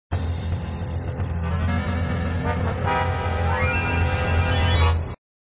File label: Sound Multiball start music